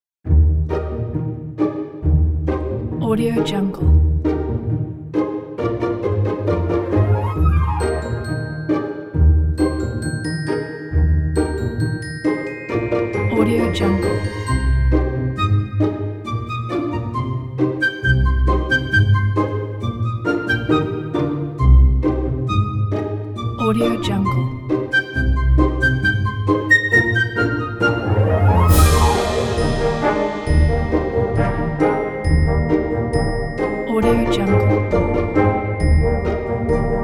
cartoon

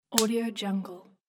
web click

